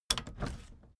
case lock open

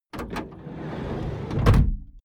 van slide door close; Autos

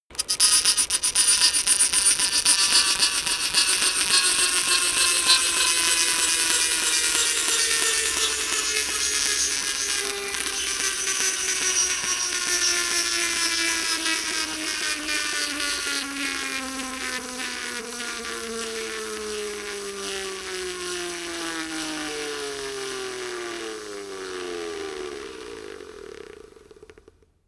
bicycle spin tire scratch plastic; fahrrad; verkehr transport

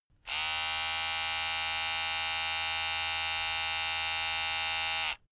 razor long

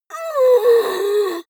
monster inhale; kreaturen

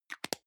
deo can lid close medium, badezimmer; haus-garten

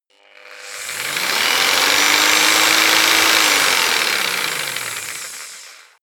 jigsaw engine medium slow long

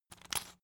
salt mill rattle; berufe; gastronomie

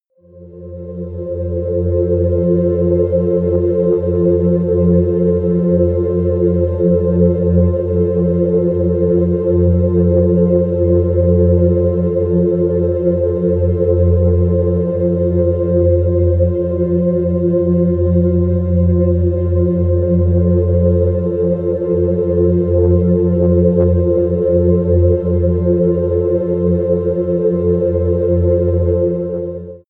spiritual, music elements

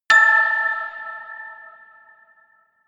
notification glockenspiel creepy fourth

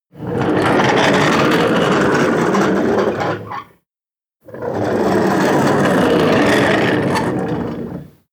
slide wooden door short

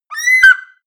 eagle call

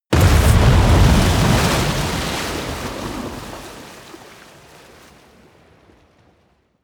explosion large water; explosionen; comedy-cartoon; soundpool

